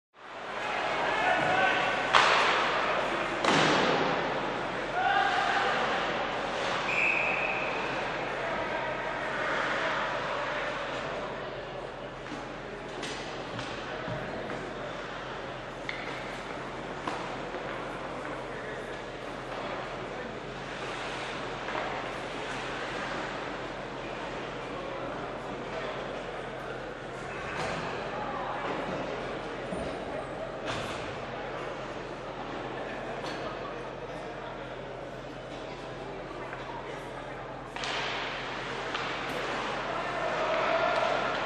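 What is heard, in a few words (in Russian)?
общие звуки игры в хоккей, катание, броски, голоса, щелчки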